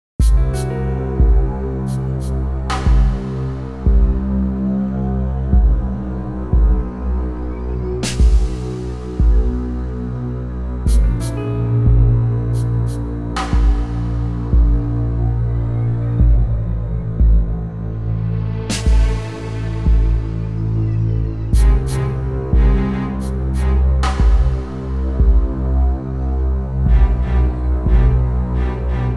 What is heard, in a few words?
Suspense; Caligula Effect 2